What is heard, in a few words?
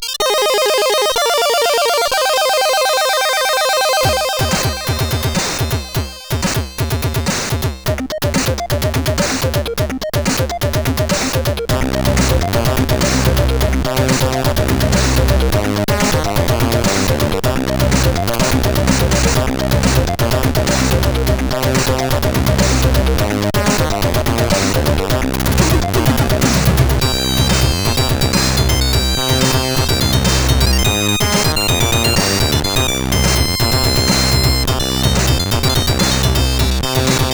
Chiptune, CSG8580R5; Mr SID; Kryo Chiptune